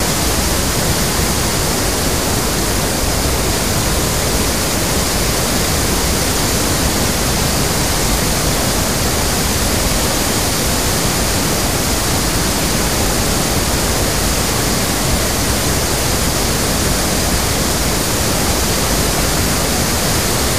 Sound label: Waterfall From Guri Dam, Venezuela